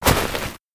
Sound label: Footsteps Mountain boots Grass Mono; Backrooms 2D